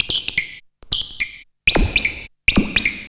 BIRDY 160, Hard & Loud Techno; USB Soundscan vol.01; AKAI

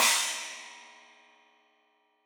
China, Crash, China Cymbal; Deeply Sampled Drums; SMD Cymbals Stereo

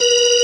B3 C 4, ORGAN; VOCAL ORGAN

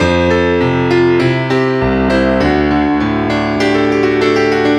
Piano; Sonic Foundry (Sony Creative Software) - Rondo Brothers Trip-Hop Loops & Samples; El Corazon

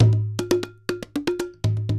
120, MIXED DRUM LOOPS 120; World Instruments 2; WORLD DRUMS

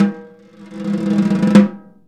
snare, Roland LA Composer; Roland - LA Composer vol. 1

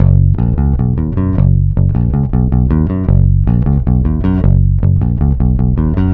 Flutes & Swingers Bass F#; Zero-G Kocktail Kollection; Construction Kitz (Lounge); Flutes & Swingers 156

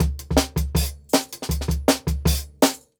160 Tam Tam, Jungle Breaks; Original Jungle Breaks

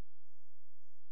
C#, Synclav FM, FX; Big Fish Audio

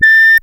COMPUTA TONE; Zero-G Ambient 1; COMPUTACHATA

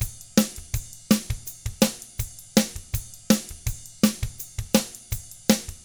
ROCK; ILIO; Double Platinum Drums 1; 164 ROCK

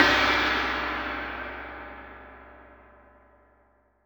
CHINESE GONG; AKAI S-Series CD-ROM Sound Library VOL-5

